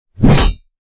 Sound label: hammer large whoosh impact nogore; soundmorph